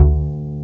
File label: WBASS D2